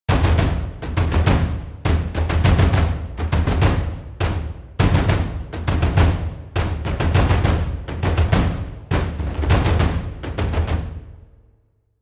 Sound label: big drums